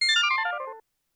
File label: crystal